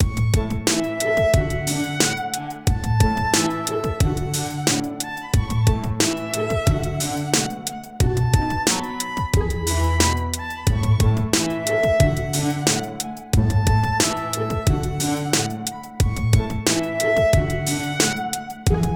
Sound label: Lyric Violin